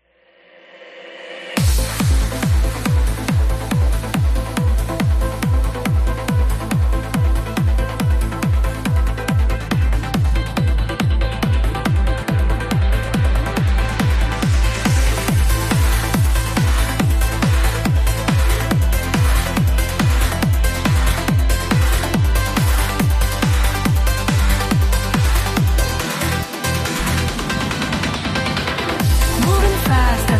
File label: some trance I am working on, industrial vibes